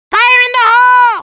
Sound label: Gun Hatz Fire Hole; Fire in the hole!; Gruntz